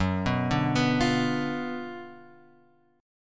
F sharp Major 7th Suspended 4th Sharp 5th, F sharp, chord; F#M7sus4#5 Chord {2 2 0 X 0 1} arpeggiated